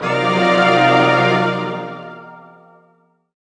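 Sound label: jazz chord, pads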